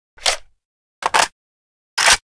mp44 Reload; Modern Weapons Pack

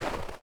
snow, dig; HunterZ G4; minecraft

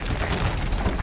minecart base

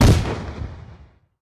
blast far, fireworks; HunterZ G4; minecraft

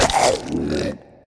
zombie pig hurt

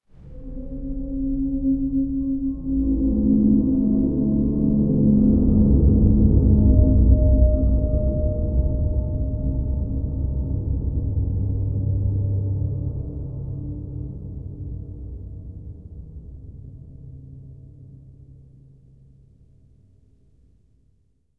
citadel outside; atmosphere; matmos hl

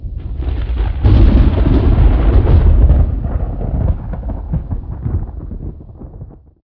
thunder, ambient weather; HunterZ G4; minecraft

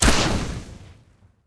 bow flame; matmos interactions

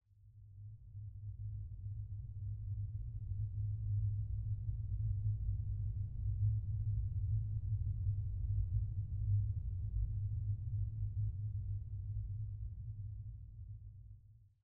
cave hit; matmos hl; atmosphere

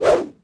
blunt attack; matmos interactions

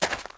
gravel, step

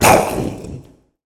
zombie pig angry

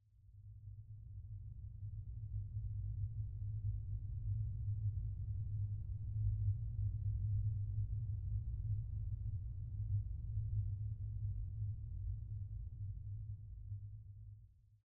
cave hit; matmos hl; atmosphere

